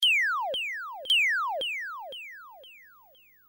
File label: electro notification